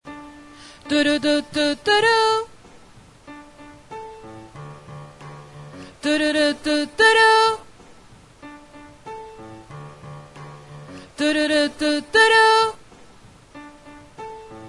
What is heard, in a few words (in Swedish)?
bas